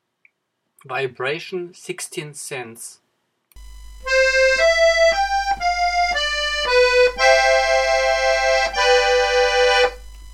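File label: vibration, accordion; english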